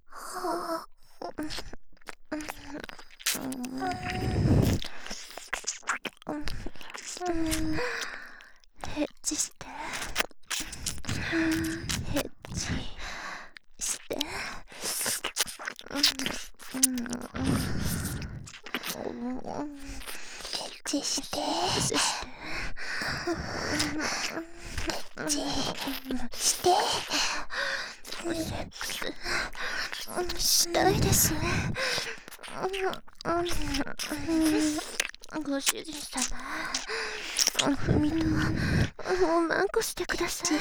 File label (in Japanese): 耳舐め; エッチしよ; ハーレムオナサポ 4人のメイドがご主人様のオナニーをお手伝いするバイノーラル～文、千曜、名月、莉桜～